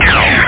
BULLET; WebWar

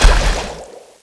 Shot Hit Water; Defiance

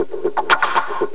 Looped Perc

